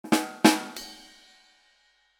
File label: Rimshot bah dum bum ding